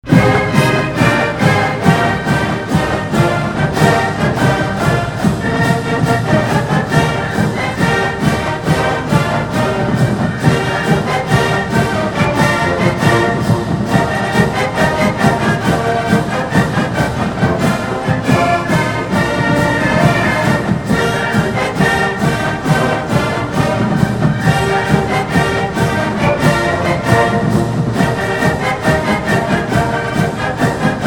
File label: Notre Dame Victory March, Pep Band; PepBandMusic